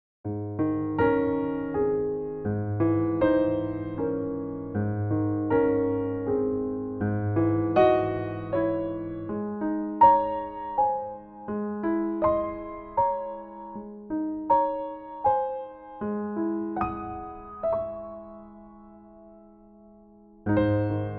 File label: Music Box; soundscapes